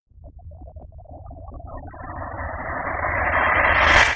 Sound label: BOILING; COMPLEXHITS; Rarefaction; Poke in The Ear With A Sharp Stick